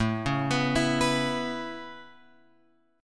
A Major Add 9th A, chord; Aadd9 Chord {5 4 X 4 5 7} arpeggiated

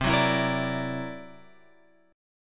C 9th Flat 5th C; C9b5 Chord {8 9 X 9 11 10} strummed

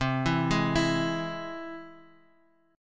C Augmented down

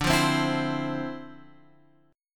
D Minor 9th; Dm9 Chord {10 8 10 9 X 8} strummed

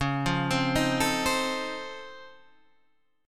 Db Augmented Major 9th

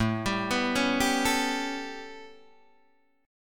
A 9th A, Guitar Open D

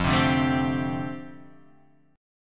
F 6th Add 9th F down, chord

